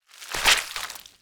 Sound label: flesh impale